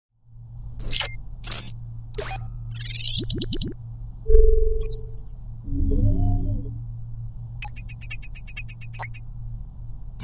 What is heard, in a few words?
Sci-Fi UI Sounds; robotic ui